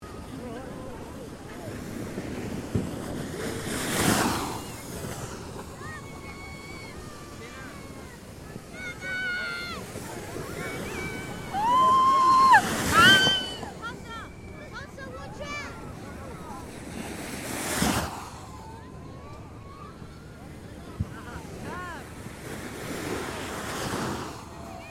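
Ambience Lake Mountain Toboggan Slopes Families, Ski resort toboggan slopes; Outdoor Sound Effects; Outdoors (Sound Effects:Background; Foundation1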